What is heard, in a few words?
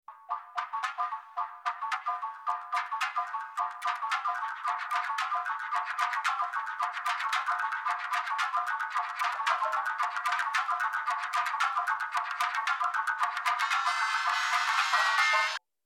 Drum